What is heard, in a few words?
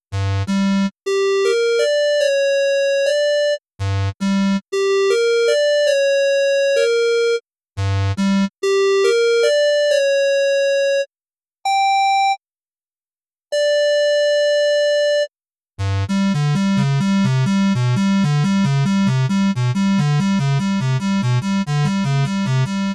retro sound